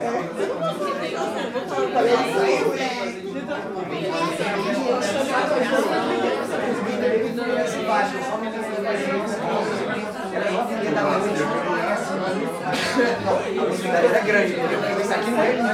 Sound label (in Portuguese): Muitas pessoas conversando em festa; multidao; Colecao Sonora do Cerrado